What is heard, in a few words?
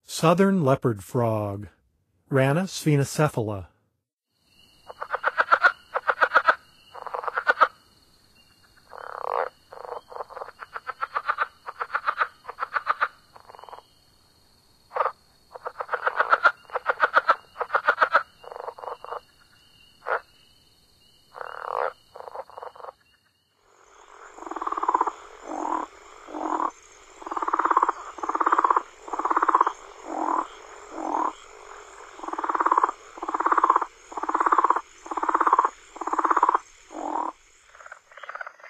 Southern Leopard Frog; N. America; Songs of Frogs & Toads of North America